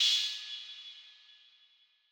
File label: OHAT, Open Hats